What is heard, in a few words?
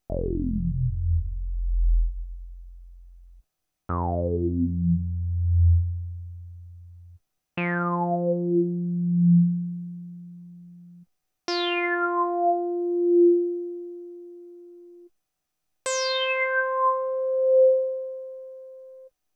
CEM LP sweep